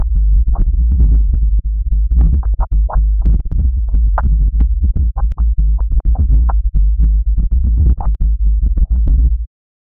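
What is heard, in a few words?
dark creepy distorted bass, glitch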